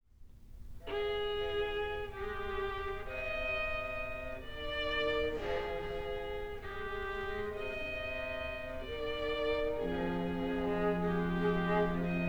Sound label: String Serenade in F Minor; Heberling